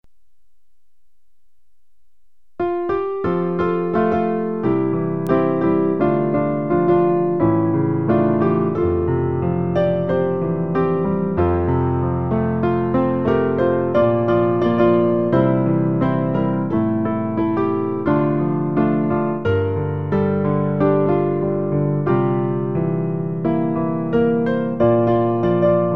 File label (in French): piano; Tu peux naître de nouveau; Pour accompagner le recueil Louez Dieu.